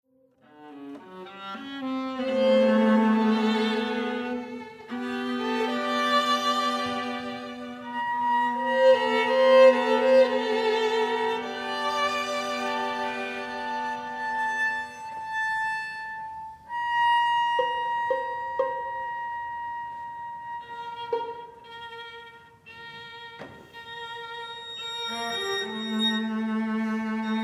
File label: String Trio; mvmt i